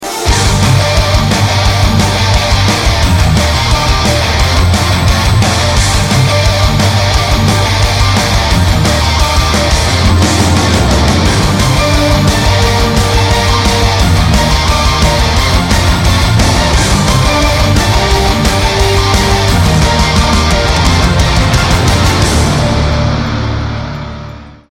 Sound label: Instrumental